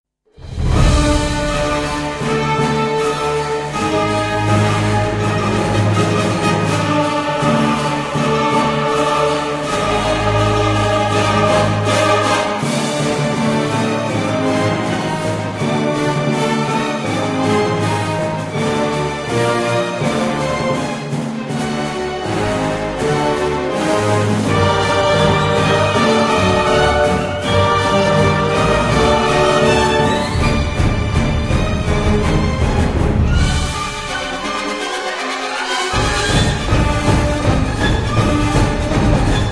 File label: Boss Music; Tarim Guardians